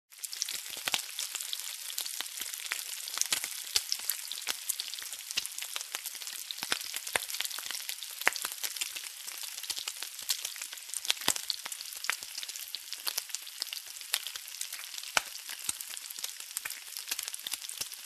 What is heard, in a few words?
Roasting in pan